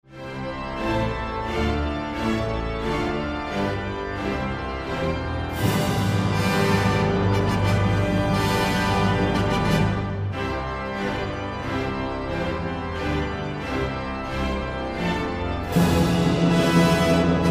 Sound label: Symphony3 Organ; Saint-Saens